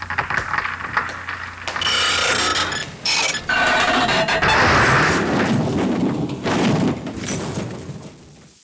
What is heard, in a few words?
scratch